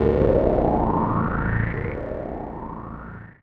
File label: KEY SHOT TEKNO 140 A; Techno2; Singleshots